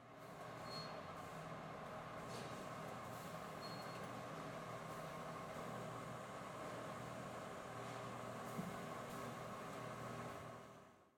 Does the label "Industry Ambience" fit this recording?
Yes